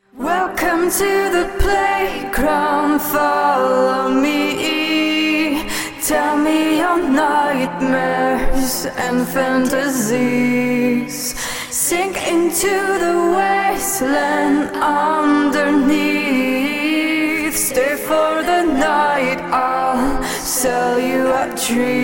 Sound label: extract vocals